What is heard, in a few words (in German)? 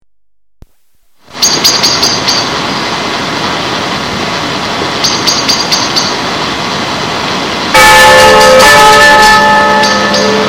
Glocke; Maria Vocor